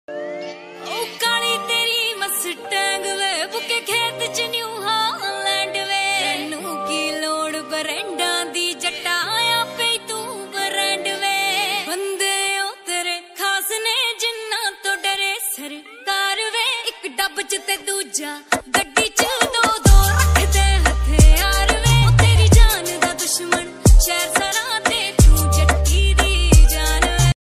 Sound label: panjabi ringtone